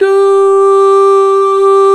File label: VOX, Male Scats